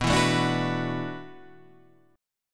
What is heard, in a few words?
E 7th Suspended 2nd Suspended 4th, B